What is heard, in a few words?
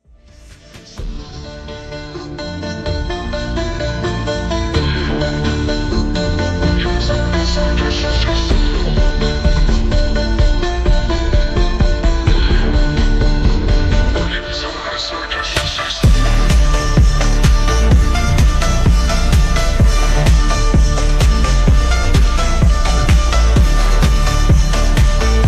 Brazilian Funk